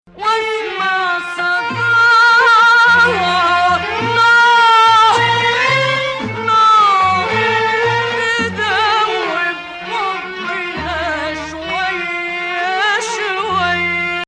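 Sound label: Ajam Hijaz, Arabic music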